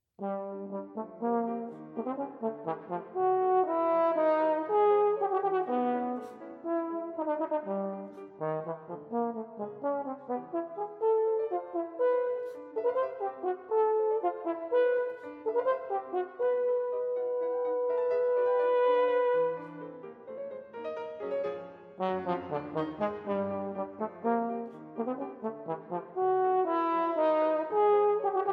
Sonata for Oboe and Piano - I. Munter

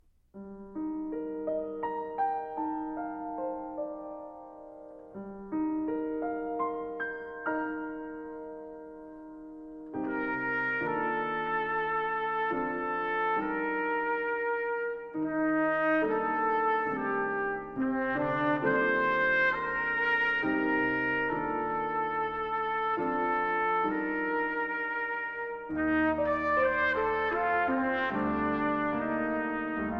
Sonata for Trumpet and Piano, Andante, freely

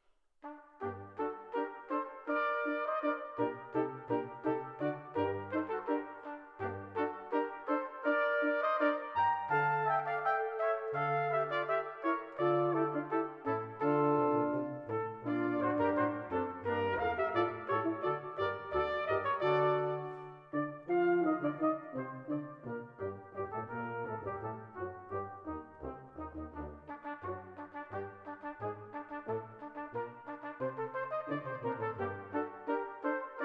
String Quartet, Allegrettto

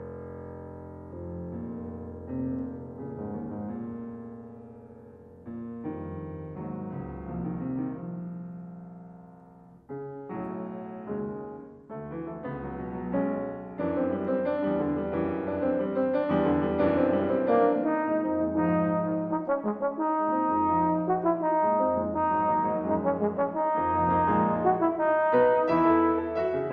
Sonata For Trombone & Piano; Mvt. 4. Allegro Moderato Maestoso

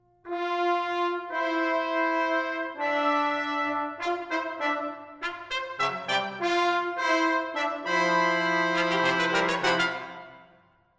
Music For Brass Octet, Theme & Variations

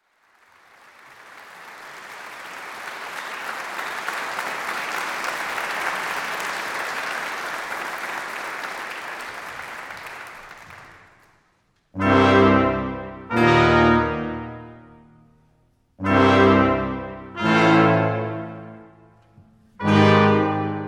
Fanfare; A Summit Brass Night

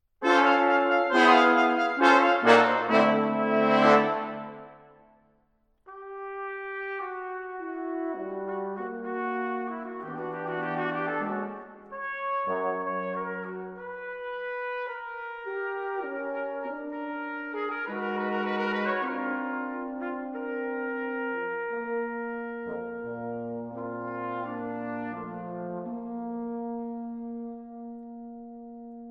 Brass; Incisioni - Five Engravings in Brass - Mvt. 1. Introduzione

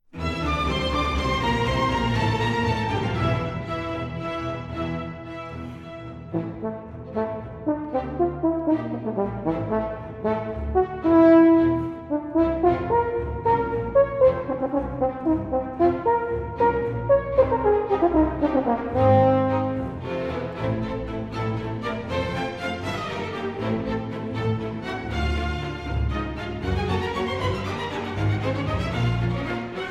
Concerto for Horn and Strings, Vivo; Bohemian Horn Concertos Havlík, Stich-P

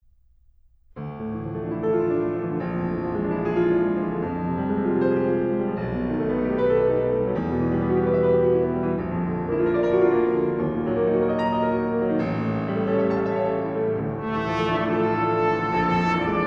Trumpet and Piano; 4. Whale Thunderbird

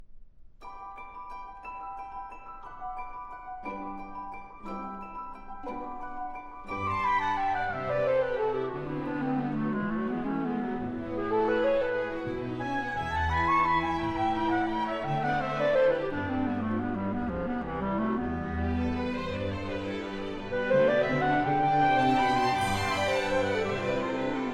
Concerto for Clarinet and Orchestra

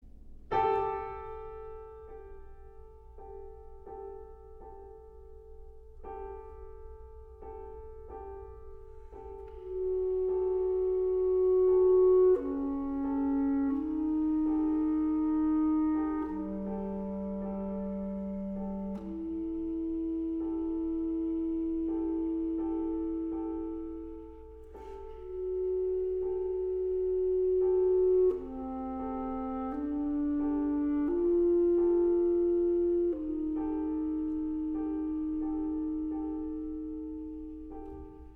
Bass Clarinet Cello and Piano, Calmo

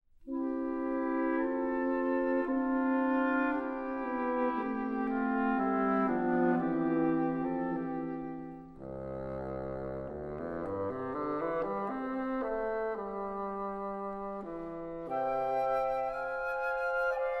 Quintet, Allegro non troppo, Woodwind Quintet

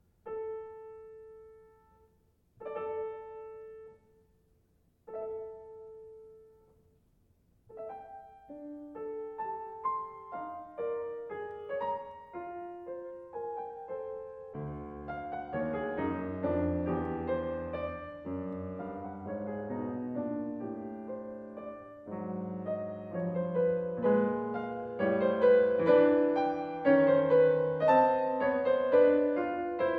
Sonata For Trombone & Piano, Allegretto Grazioso

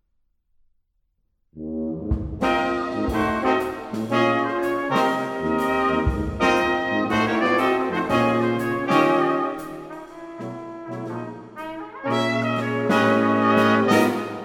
Maple Leaf Jazz, Carolina Brass; Art Collection music of Arthur Frackenp

